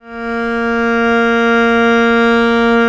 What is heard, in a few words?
A#2, VIOLE, Historical Instruments; USB Soundscan vol.23